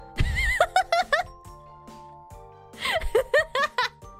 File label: Laugh; IRyS